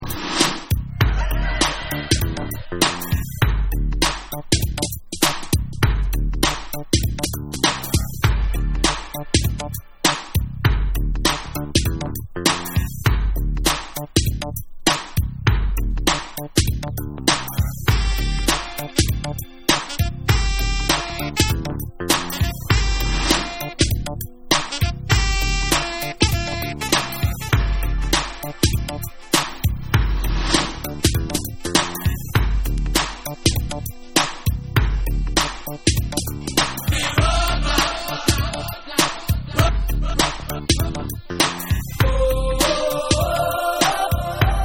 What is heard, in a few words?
Horn Jammin Dub